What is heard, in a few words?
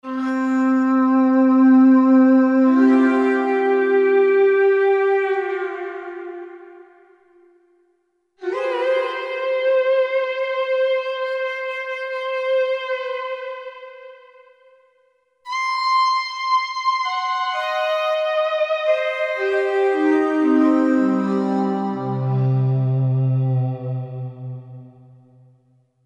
Shaku Voice; Korg MSC-10S Ethnic